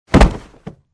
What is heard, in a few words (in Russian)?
telo ruhnulo